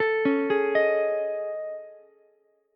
A Major Sharp 11th, Ukulele, C6; AM#11; arpeggiated; JGuitar

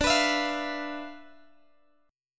D Minor 6th Add 9th D, Guitar, Open G

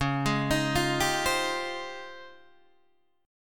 C sharp Major Sharp 11th, Guitar DADGAD

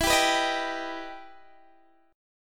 E Minor Major 7th E down Guitar Open D

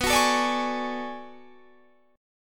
B Minor 13th B Guitar Half Step Down, chord

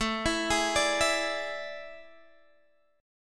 E 7th A, guitar; E7/A; arpeggiated

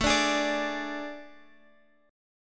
A Major 7th Suspended 4th, Guitar, Open F; AM7sus4 strummed; 21,21,20,21,x,x

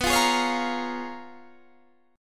C sharp 13th B

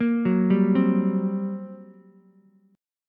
G Minor Major 7th Double Flat 5th, G, Ukulele; arpeggiated; JGuitar

